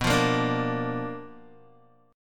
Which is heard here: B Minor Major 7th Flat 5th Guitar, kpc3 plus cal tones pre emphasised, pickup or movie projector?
B Minor Major 7th Flat 5th Guitar